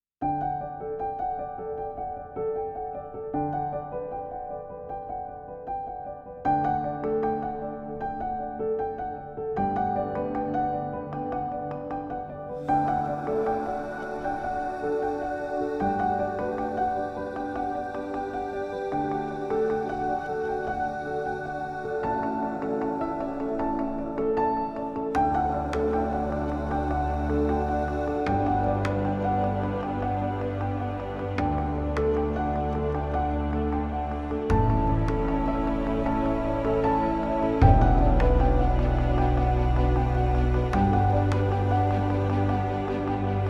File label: Beat